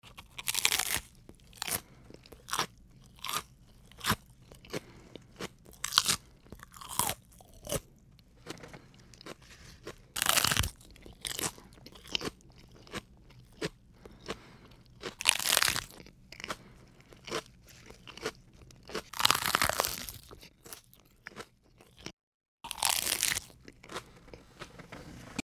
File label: lettuce